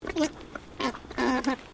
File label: ANIMAL ALPACA MALE GRUMBLE; Alpacas on a farm in Gippsland Victoria; Miscellaneous Animal